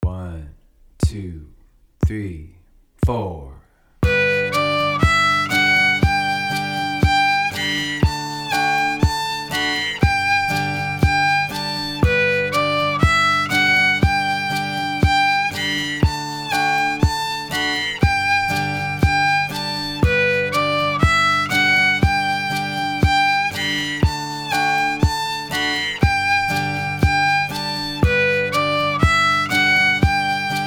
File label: Bird in the Bush, fiddle